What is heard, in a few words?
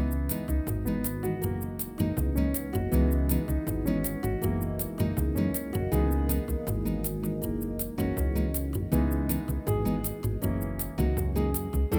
C#-E Dorian